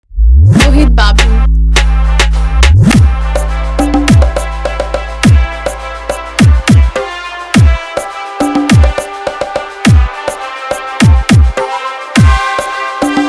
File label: National Anthem, Pure Indian Desh Mix